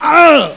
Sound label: pain